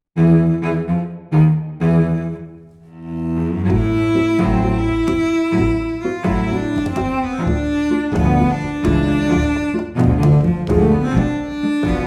Tango

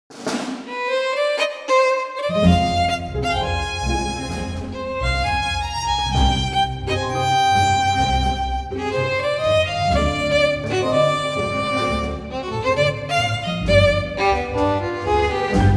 Schnulzentango

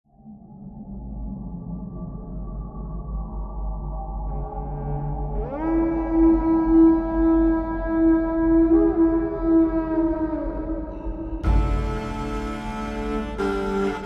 Big Tagelharpa; Call of Valhalla; Eduardo Tarilonte

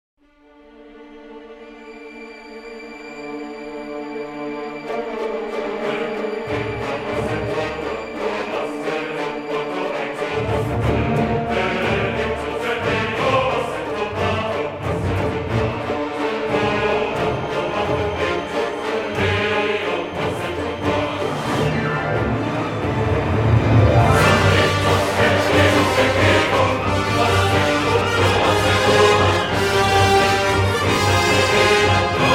Strings; Musical Sampling; Adventure Strings

